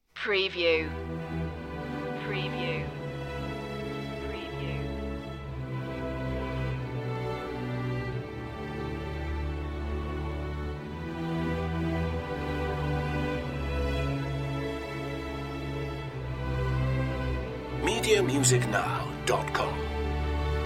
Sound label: intro part live strings only